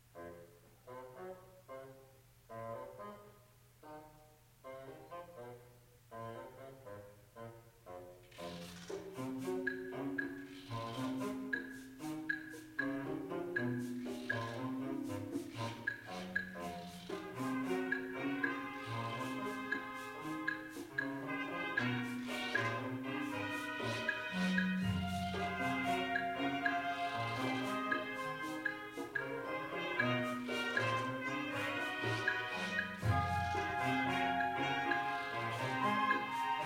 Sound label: tango